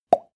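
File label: plop water drop, body sounds; koerpergeraeusche; menschen; soundpool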